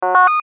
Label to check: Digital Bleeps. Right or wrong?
Right